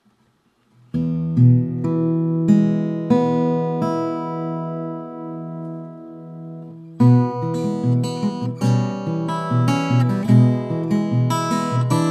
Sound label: parlor, guitar